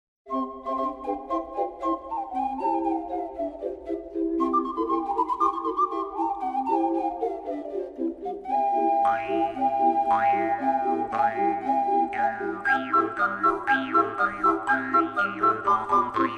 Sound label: Polka